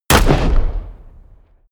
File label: shot